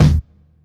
punchy steel kick drum sound d sharp key; Duration 0:01; Samplerate 44100 Hz

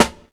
boomy snare drum sound f sharp key

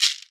shaker percussion g sharp key

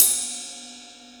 ride single shot f key

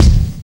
bass drum single hit b key; tuned drums

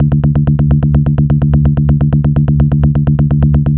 techno repetitive bass mid; instruments; loops

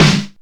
deep steel snare drum sound D key

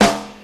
steel snare drum sample E key

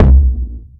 wet kick drum sound c key

